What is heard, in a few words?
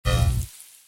laser impact